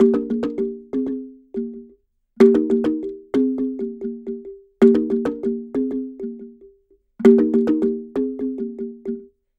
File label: Marimba 100bpm Eb, African; Quazibeat; African Secret